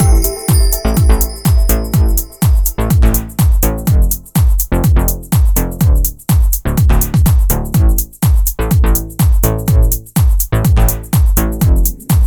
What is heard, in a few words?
House